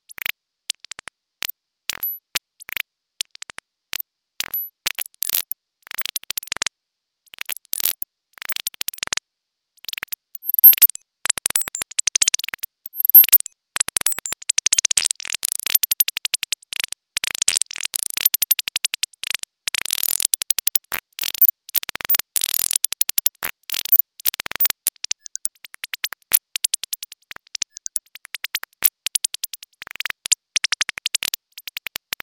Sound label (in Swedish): turtursparv; Swedish birds; Sädesspov och andra fåglar